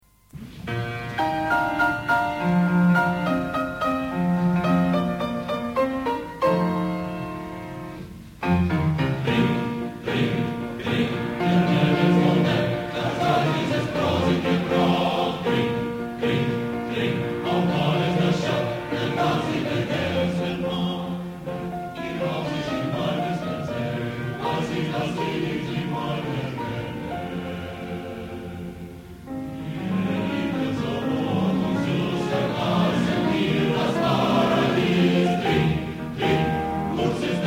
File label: male choir, live; male choir of mephi